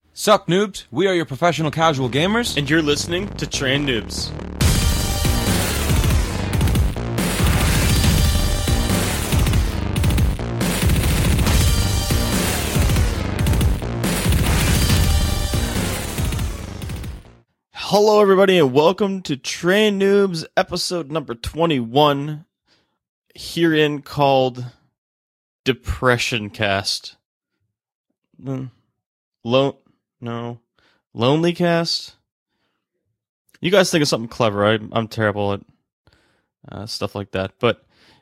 Solo

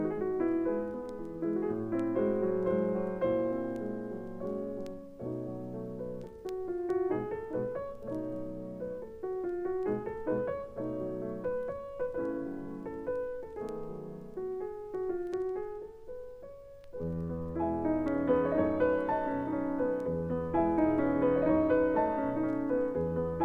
Polonaise for Piano Duet; Weihnachtsbaum; Fest - Polonaise; R.296; Franz Liszt

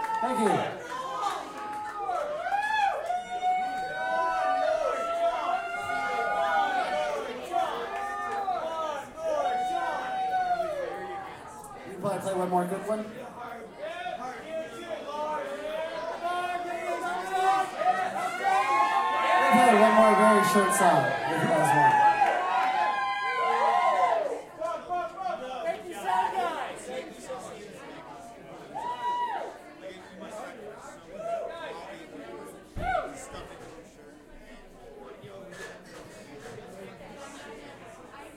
Encore Break; AKG P170

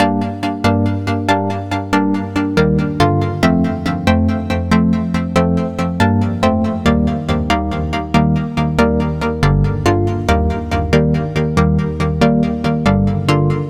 Chord Loop 140 G min, Synth Loops; Cymatics; Synth Loops Vol 1

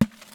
single hit; Listen01 Sample Pack

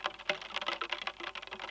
Rain Drum; Cumbria Remixed Sample Pack

